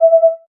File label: passthrough, earcons; chromium; chromeos